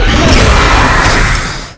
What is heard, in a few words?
vaporizer; garrysmod